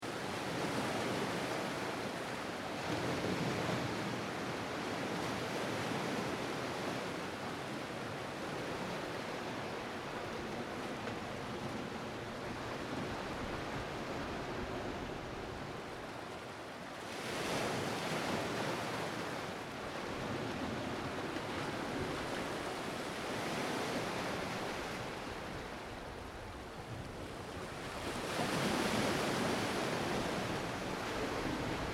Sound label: Ocean Surf Loop, Smooth, relaxing Ocean Waves; Ocean Sound Effects; nature; Lynne Music